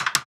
perc gallop, Drums, One Shots; Percs; Slate; SD AURORA Sample Pack

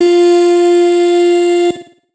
Omnivox oneshot F, Vocal; Slate Digital Omnivox Vocal Sample Pack

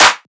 clap round, One Shots, Claps; Drum Sample Pack; Slate; SEISMIC

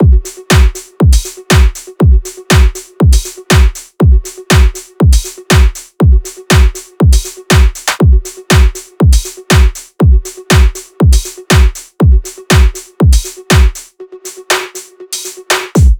drums 120, Full Drum Loops; drum sample pack; SEISMIC; Slate

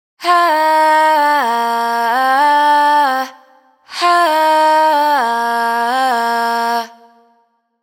riff Airy Hah 128 Gm wet, Vocal; Slate Digital Omnivox Vocal Sample Pack

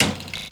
perc flutter; Drums; One Shots; Percs; Slate; SD AURORA Sample Pack

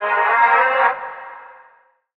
vocal graceful Ab; SD AURORA Sample Pack; Slate

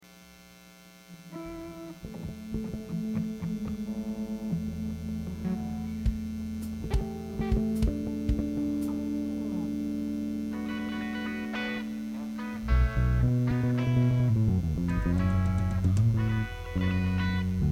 Buzz and Feedback Mix

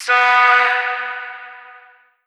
Omnivox oneshot B, Vocal; One Shots; Slate Digital Omnivox Vocal Sample Pack

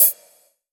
hat faze, Open Hat; Drum One Shots; SD MIDNIGHT Sample Pack; Slate

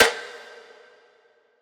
snare hollow; Drum One Shots; SD MIDNIGHT Sample Pack; Slate

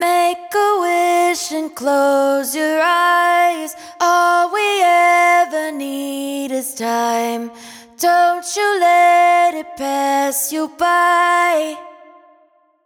hook Make A Wish 120 C# wet, Vocal; Slate Digital Omnivox Vocal Sample Pack

